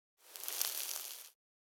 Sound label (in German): Zigarette